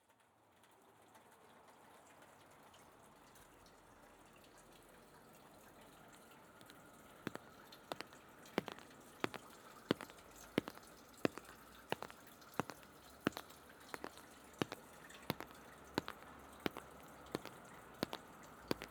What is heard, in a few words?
soundscape olomouc; Soundscapes